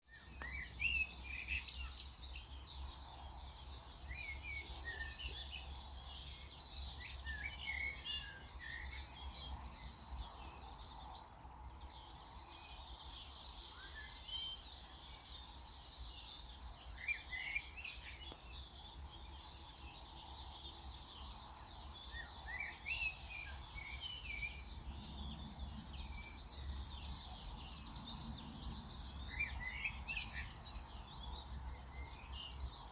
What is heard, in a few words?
birdsong